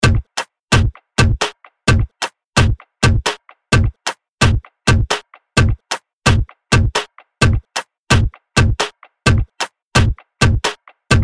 Latin Melody Loop Gmin 130 BPM Drums

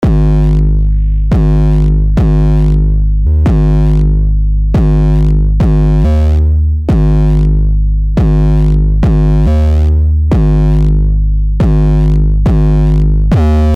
Serum 808

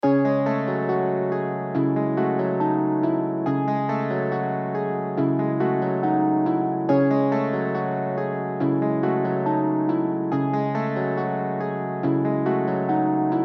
Drill Guitar Loops Cm 140 BPM

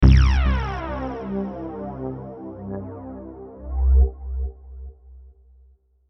Bass One Shot

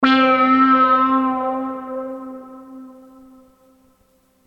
Lead One Shot

